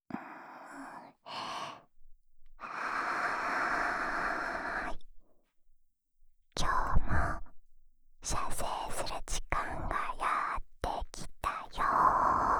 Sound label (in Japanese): オナサポ用耳舐めループ（左耳, ASMR